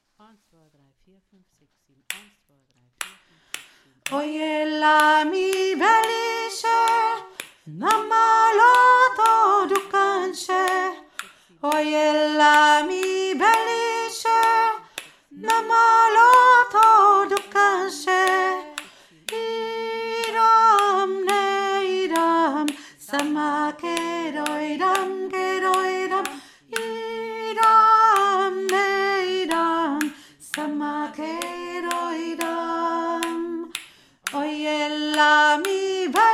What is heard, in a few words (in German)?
oy ela mi veliche hohe stimme